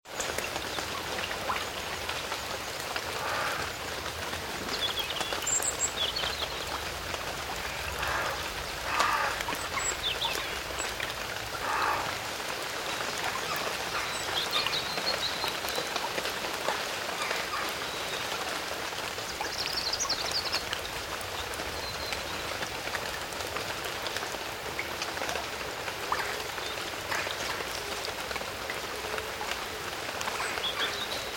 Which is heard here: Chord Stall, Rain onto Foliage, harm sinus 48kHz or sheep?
Rain onto Foliage